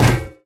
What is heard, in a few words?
physics turret impact sm